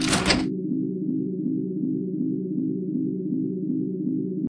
spin Button Click; netent; bloodsuckers

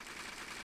reel spin loop; netent; copycats

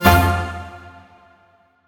win low; netent; copycats

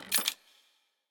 feature Counter; netent; wishmasteroct

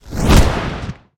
Exit Text Zoom; netent; narcos

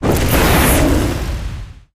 Wheel Zoom; netent

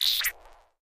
max Bet Button Click; netent; doublestacks

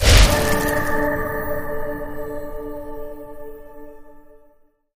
start Feature Ice; netent; magicportals

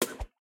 reel Bounce; netent; doublestacks

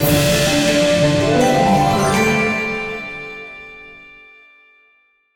large Win; netent; secretofthestones

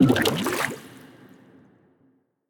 symbol Turn To Wild Short; netent; wishmasteroct

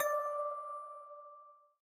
countup End; netent; magicportals

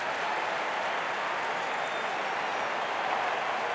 bonus game ambience loop; netent

